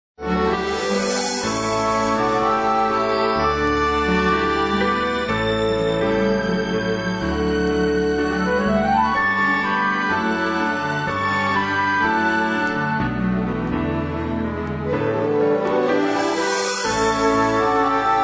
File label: instrumental